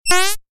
fart sound

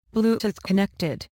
Bluetooth connected

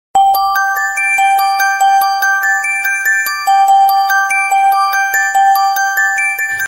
instrumental, ringtone

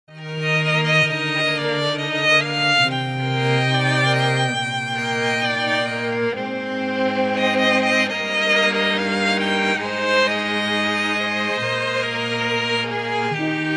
violin cover; les mis rables

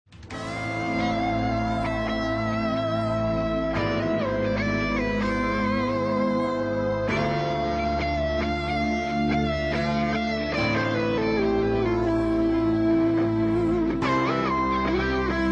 guitar solo; metalocalypse; strife

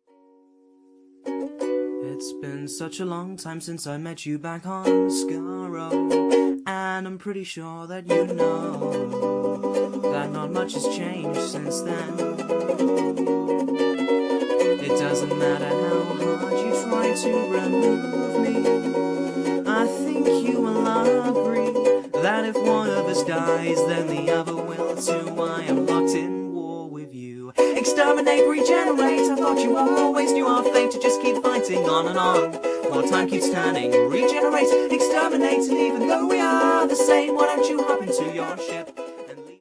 ukulele

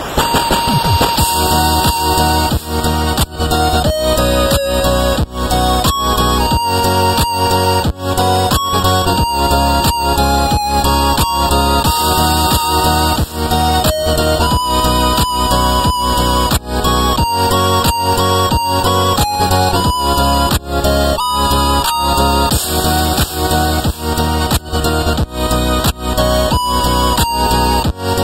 8 bit tribute

